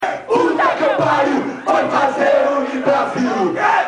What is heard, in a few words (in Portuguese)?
bateria